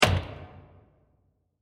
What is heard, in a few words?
Door Impact; Miscellaneous Impact; Impacts